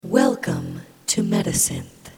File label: harmonic